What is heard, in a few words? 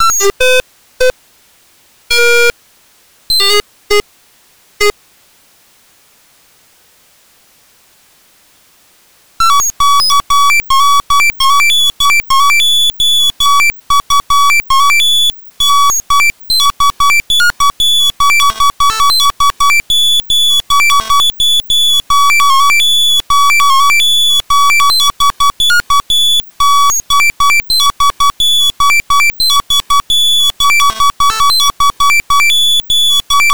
triangle